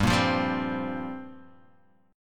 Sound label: F Suspended 2nd Suspended 4th G